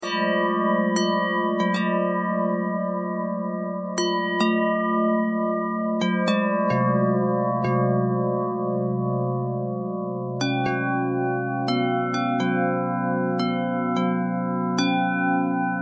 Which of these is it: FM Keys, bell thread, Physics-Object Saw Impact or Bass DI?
bell thread